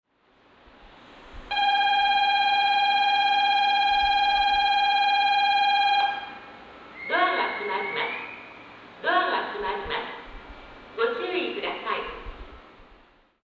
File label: bell, osakaloop